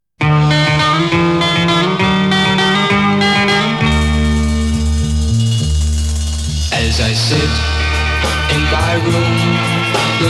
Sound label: with female vocals